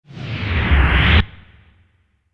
Power Down

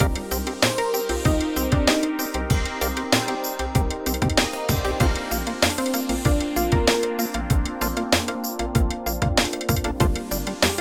Balloon Fantasy

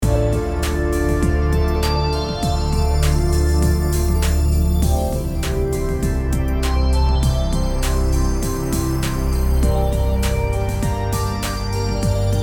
Puzzle Trance

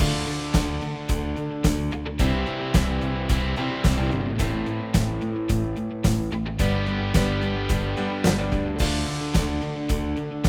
Rock Drama

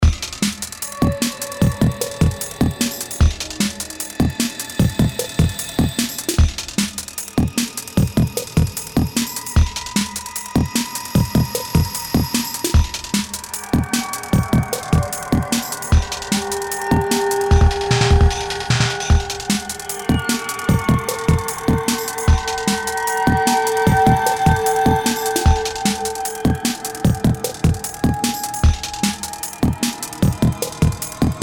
Creepy Action; Horror/Surreal Music

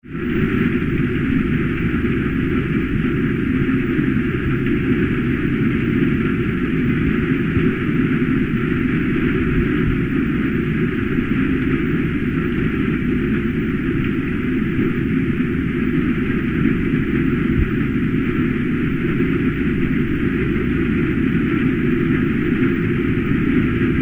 Jet Plane Ambience High Altitude Static Interior

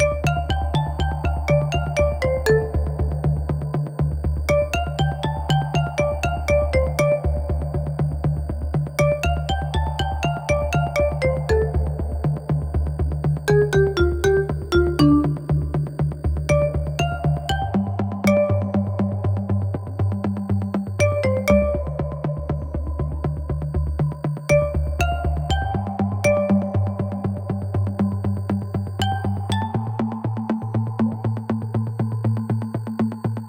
Quirky Conga; funny

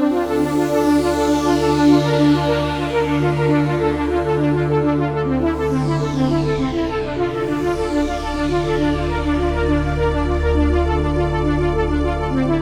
Light Years, sci fi

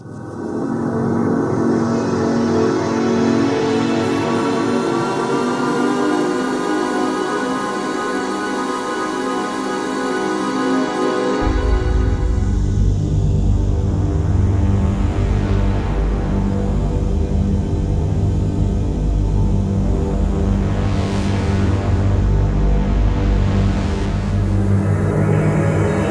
Arrival, dark ominous music

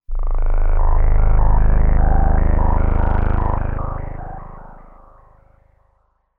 Cyber Chime

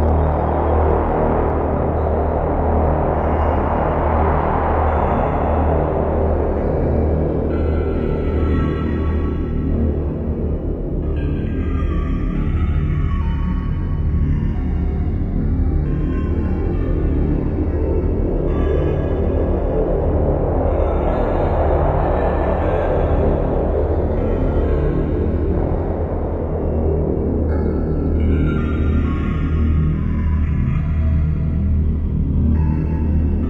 Ominous Goings On, dark ominous

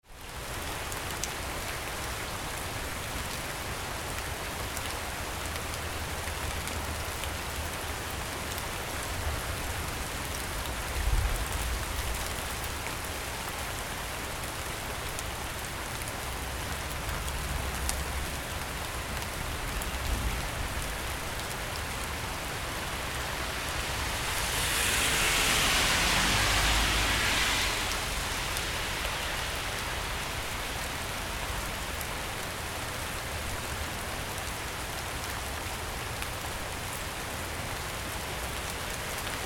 Light Rain Thunder

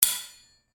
Clank

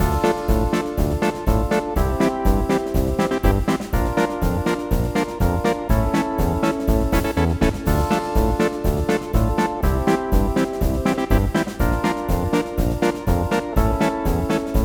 The 8 Bit March

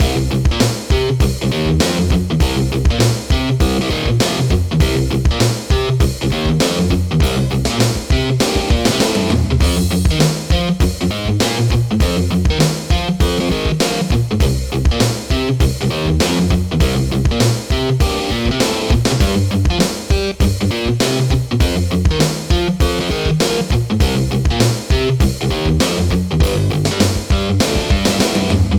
Guitar Mayhem; action

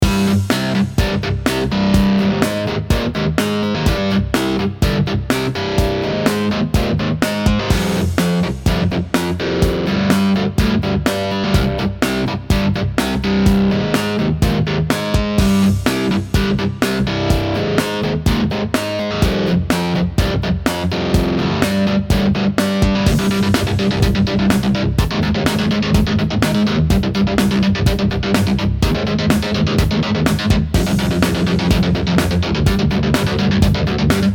Guitar Mayhem

